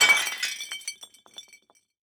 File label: bottle drop, glass bottle drops and rolls on pavement